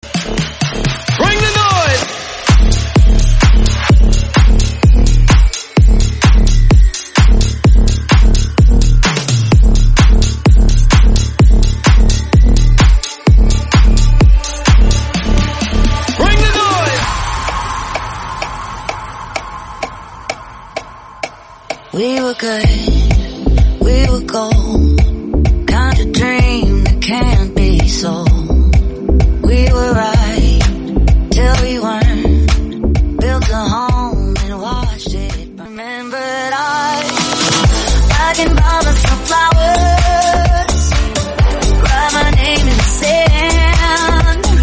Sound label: Rattle Intro Bighorn House 128BPM